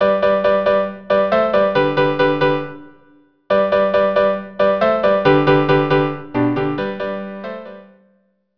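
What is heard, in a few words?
violin piano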